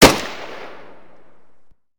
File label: loop, 5.45x18mm; Caliber